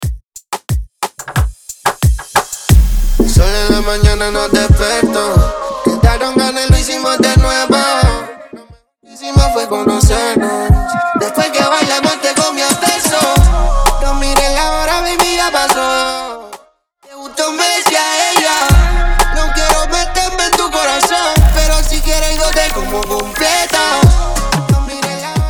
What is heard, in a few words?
acapella 90 bpm; DJ Hebertshot; Latin music remixes